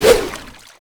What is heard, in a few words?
Water Balloon Fire